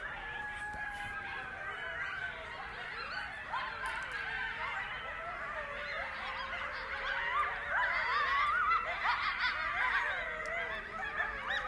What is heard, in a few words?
coyote calls